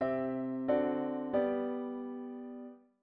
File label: diat V7 inv